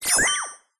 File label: science fiction computer glitch tone